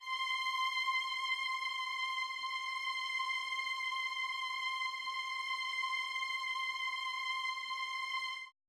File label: Camp Fire Strings